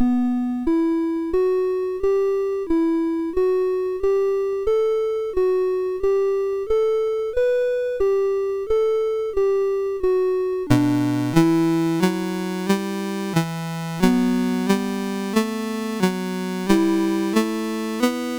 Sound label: Prelude in E Minor, MOS6581R4